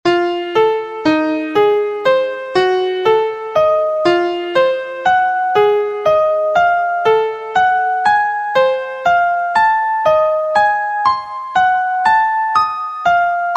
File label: Degree 1 2 b3 4 b5 6 Atomic Scale Key Eb; harmonic and melodic equivalence; two triad pair